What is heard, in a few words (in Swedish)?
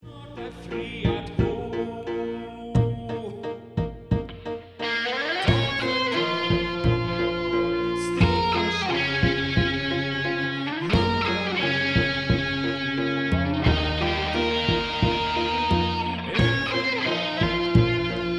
gitarrmix Refräng